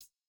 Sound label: hihat; Reference Beat BPM120; j city pop 1980